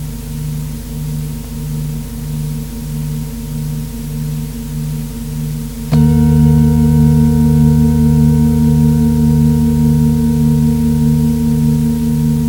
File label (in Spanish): muestra sonidos hemisinc; Sounds Hemisinc 2; hemi-sync; megabrain